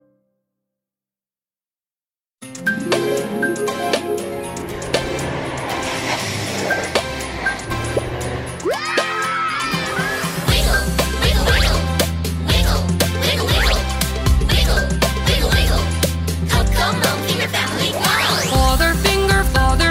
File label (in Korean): 영어 여 동요 Finger Family Song